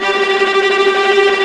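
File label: TREMOLO STRINGS G1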